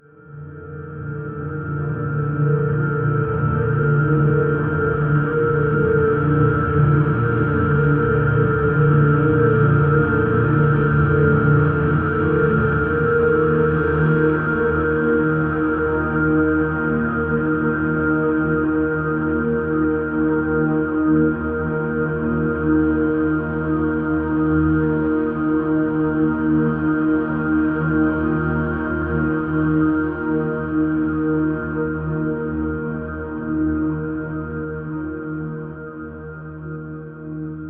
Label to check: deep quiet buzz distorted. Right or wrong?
Right